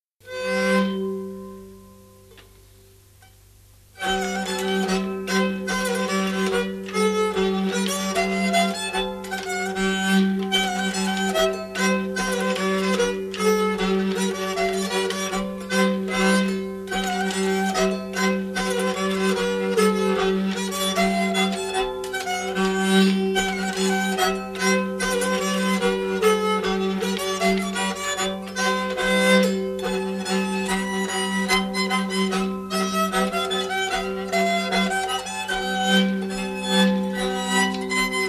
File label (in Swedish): Schottis i turer